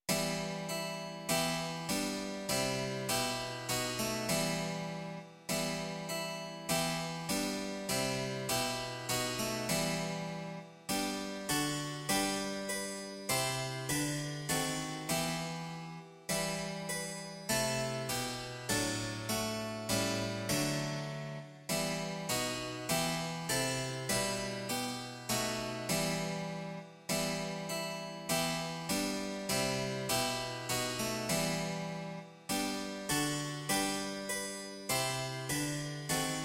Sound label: harpsichord; Miluj Pána Boha svého; Imaginativní verze